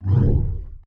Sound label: Whoosh Woow